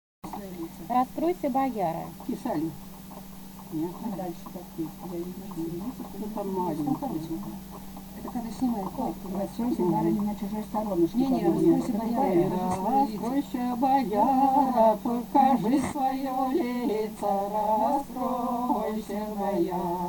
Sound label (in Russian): Свадебные песни; Раскройся, бояра; Объекты нематериального культурного наследия Псковской области